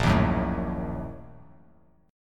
Bb-Major 7th Suspended 4th Sharp 5th, Bass Standard; BbM7sus4#5 strummed; JGuitar